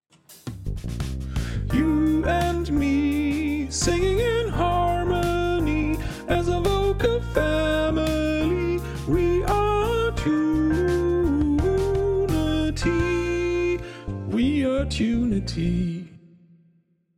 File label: alt pitched